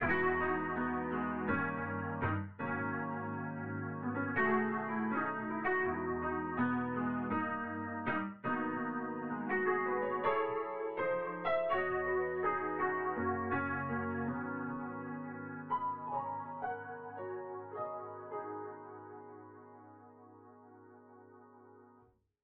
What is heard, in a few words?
lofi